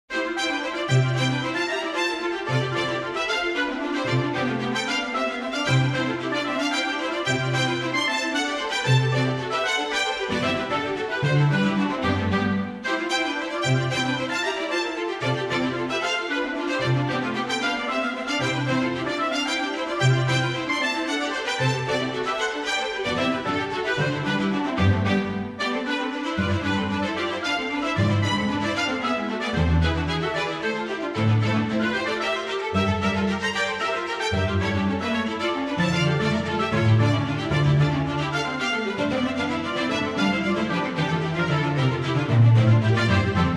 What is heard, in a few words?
Orchestral suite Forlane; johann sebastian bach; Bwv1066